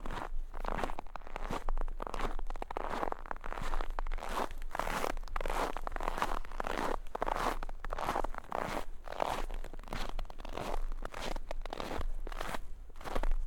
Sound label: snow foley walking on snow; sonniss gdc